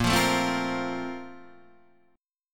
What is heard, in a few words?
A sharp Major 7th Suspended 2nd; A#M7sus2 Chord {6 8 7 5 6 6} strummed